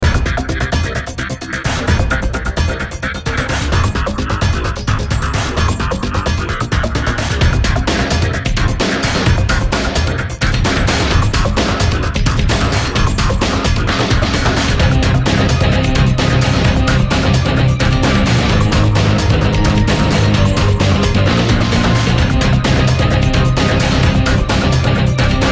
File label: battle music; muzyka iz igry